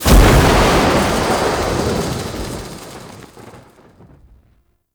Void Dragon Firebreath Cast Lightning; Enemies; Abilities; Warframe; Duviri

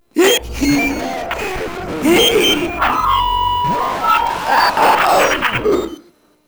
Proto Infested Runner Idle; Warframe

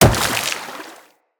Wall Jump Water, Footsteps; Wall Run; Characters; Tenno

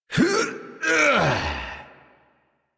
Exertion Adult Operator; Warframe

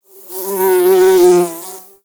Exterior Foreground Bug Flyby; Ambience; Warframe; Duviri

